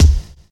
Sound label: silky kick drum sound D# key, Royality free kick drum single hit tuned to the D# note; kick drum samples; D sharp key